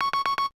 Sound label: Beep; SKY IM-U450L; Camera